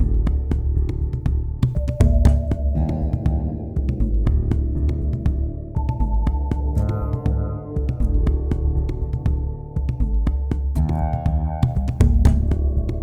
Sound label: level 2 cue